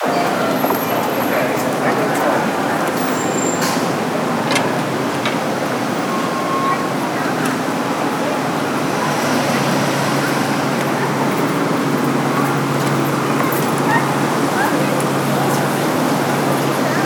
Foley; Downtown

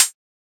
Hihat; Ghosthack Advent Calendar Day 4

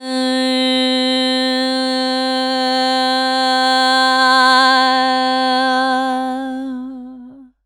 Vocal Lines Cm 60Bpm; One-Shots; Ghosthack; SkyRealm

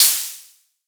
Open Hihat, Techno Drum Shots; Ghosthack; Free Techno Drum Shots 2024

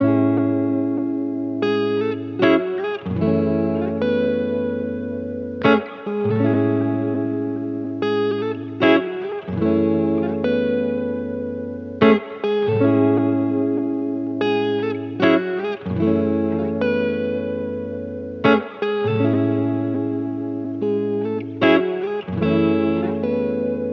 Electric Guitar Wet 75 BPM C# Min; Melodics; Cymatics; Eternity Sample Pack; Guitars - Electric

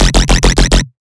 Riddim Bass Hit F; Quack

